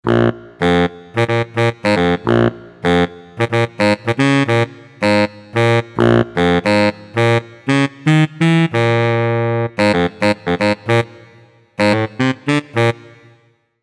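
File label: Baritone Sax, synth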